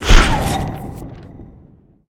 fire; Smite; Zhong Kui

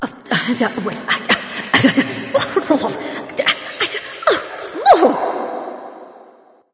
grumble light; smite; voicepack; hel

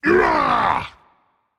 grunt attack rage; chaac

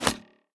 roman ranged bow impact; smite; npc; roman archer

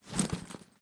minion armor; smite; npc; roman archer

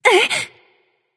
surprised; smite; mulan

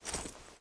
angelic step; smite; thanatos

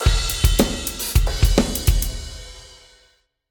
defeat drums; smite; raijin

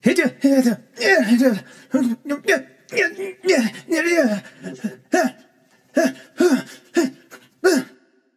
fear; smite; janus

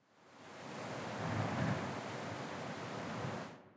Victory Wind; Smite; Susano

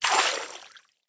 passive waterbowl; smite; kuzenbo

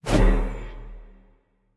swing; smite; baron samedi

